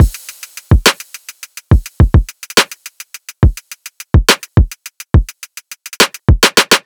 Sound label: Riddim Drum Loop 140 Full